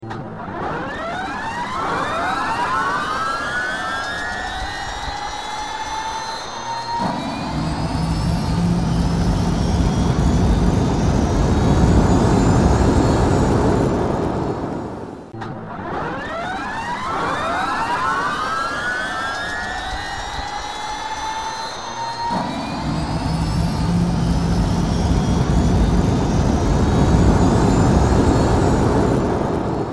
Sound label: turbine startup